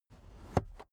car headrest full adjustment slow

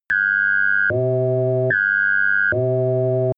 sci fi alarm industry machine; soundpool

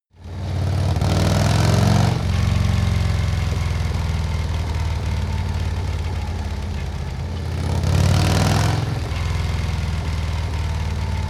motorcycle harley accelerate triple hard; motorraeder; verkehr transport

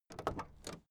car camaro open door

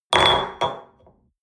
espresso upset table hard, restaurant cafe

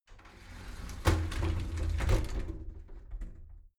shower slide door inside close soft

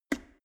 plastic water boiler open lid; maschinen; wasserkocher

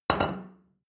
plate place table medium

